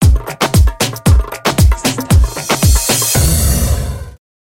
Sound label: Darbuka Sms Tone